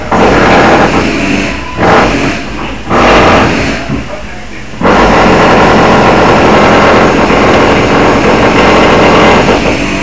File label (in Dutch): drilboren 22khz